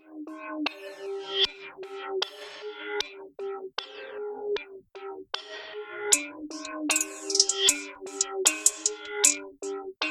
Beats

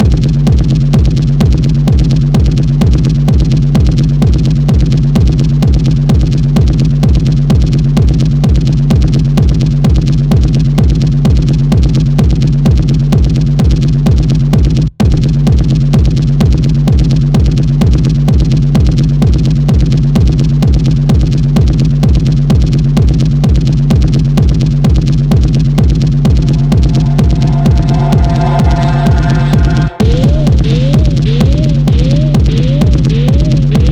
Techno

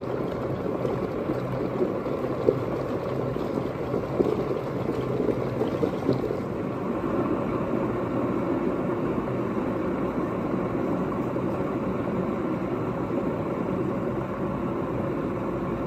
Boiling Water Ambience

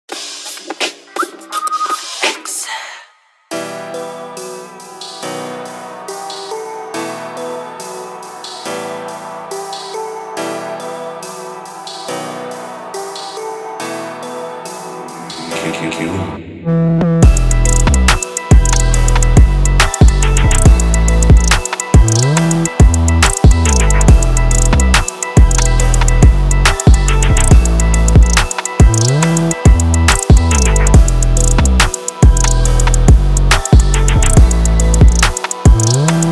Beats

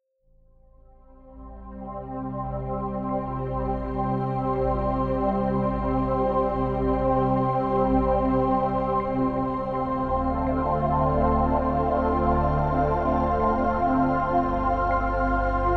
528 Hz Music

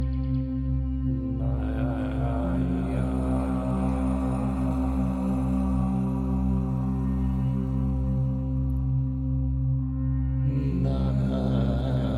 Whales and Violins

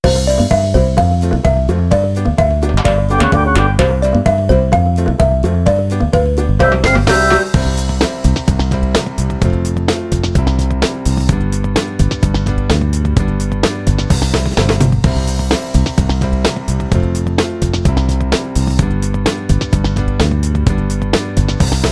Kids Song Instrumental

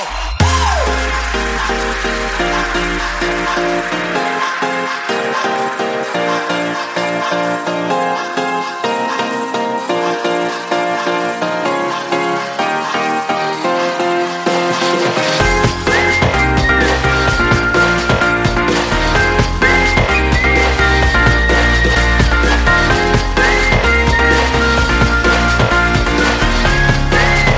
Whistle

